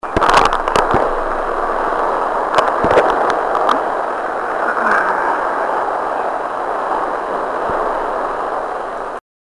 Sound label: Sigh; EVP; During a sole investigation to the Spirit Circle